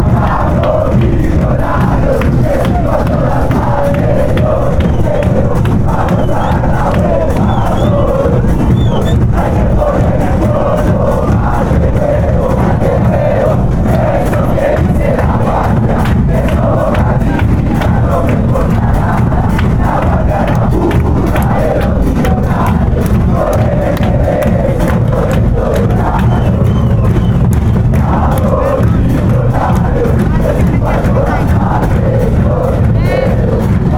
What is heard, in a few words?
millonarios chant, fanchants; mfc